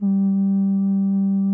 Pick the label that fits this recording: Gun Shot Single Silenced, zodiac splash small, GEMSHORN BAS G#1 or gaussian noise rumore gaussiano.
GEMSHORN BAS G#1